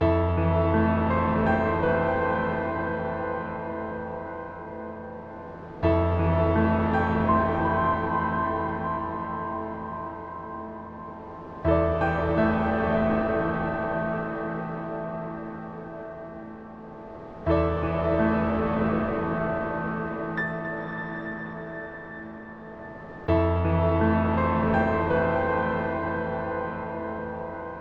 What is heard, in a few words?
Solitude Loop